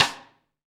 SD RI, SD Rim; Sound & Vision - Gigapack I

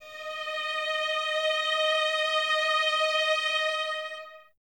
Violins Soft D#5, String Ensembles; Miroslav Vitous; 11 Violins

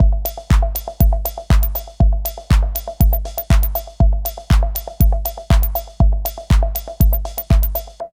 LOOP, Tekno Industrial; East West

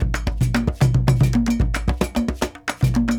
Mai, full ensemble, world percussion; Sony CS - World Percussion

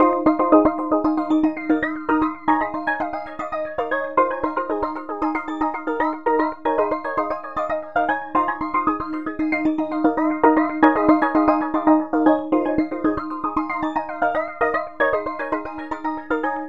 synth arp; Electrocution

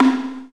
Slit Drum; Roland BK-5; Percussion

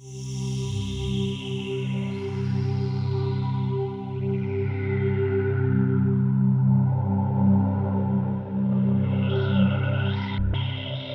POLY SAW, Roland JV 2080, C2

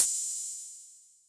H Opn, Hi-Hat, Yamaha DTXpress

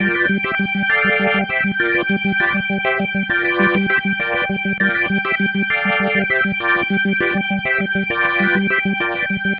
Pattern, Organ

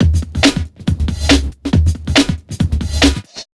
Big Beat Drumloops, 140; USB Soundscan vol.25; AKAI

